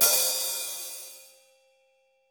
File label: HH, Drums; Bob Clearmountain Drums I